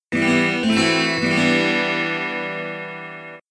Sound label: Game Over Jingle; Dillon's Rolling Western; Nintendo 3DS